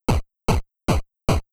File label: Noise Kick Triangle, chiptune